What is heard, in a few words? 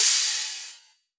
Rides, Cymbals